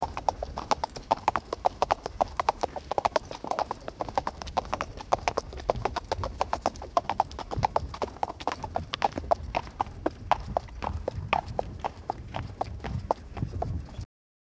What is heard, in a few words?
horse walking on cobblest